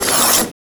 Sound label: puck slide down; Left 4 Dead 2